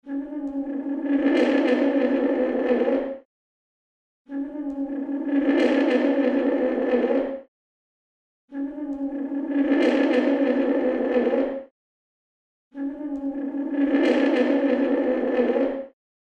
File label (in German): Klangbeispiel Posaune; vermittlung neue musik